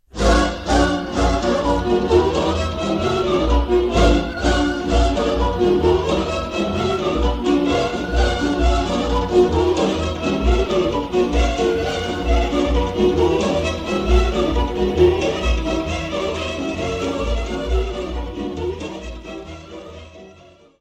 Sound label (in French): Roumanie; Trei Pazeste